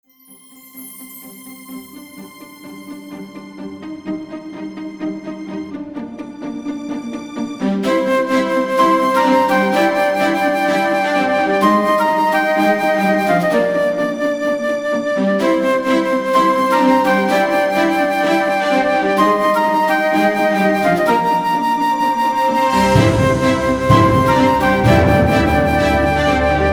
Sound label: Epic Orchestral